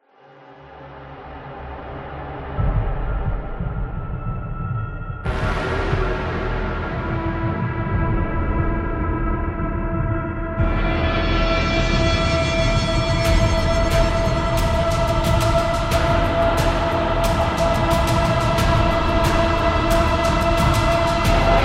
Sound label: Crime